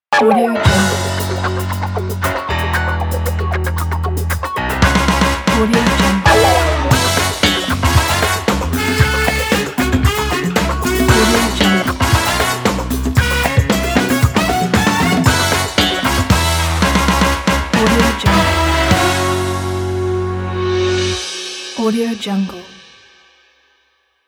groovy funk opener

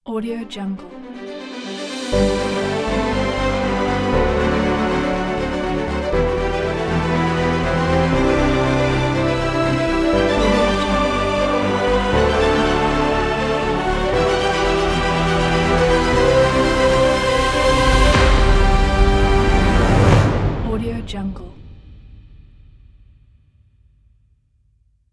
awards winners logo; wavemusicstudio

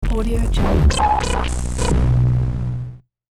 techno grumble; sound ideas

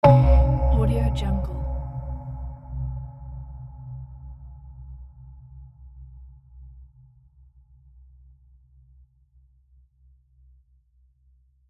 impact effect

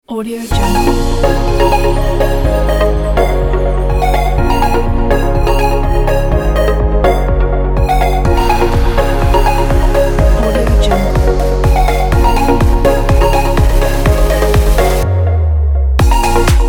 upbeat summer sports; music-for-videos

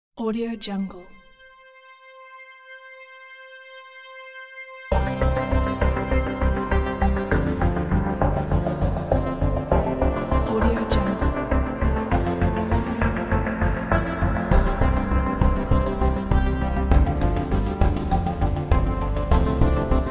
exploring ambient; haarddave

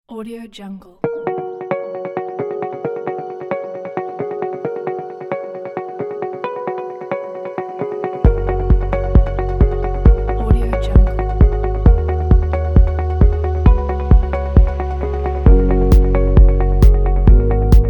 simple communications

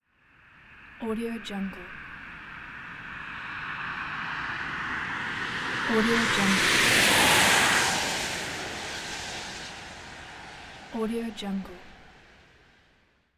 car passing by on wet road

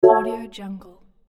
bright futuristic video game browse menu sound; tony-parsons-music

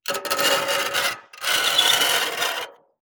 scrapes and scratches; soundbits-soundfx

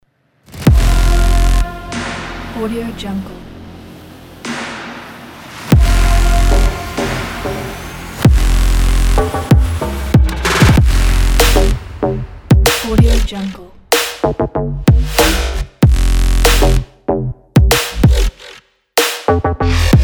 cinematic sport

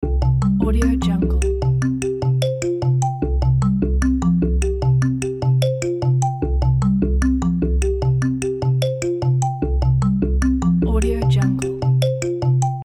exploring area

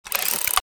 mechanism wind rev; soundmorph

